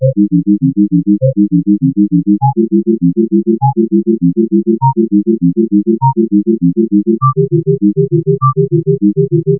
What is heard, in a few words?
sine; Well Tempered; both hands